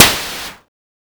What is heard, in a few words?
Synth snare; Nu Dance Electro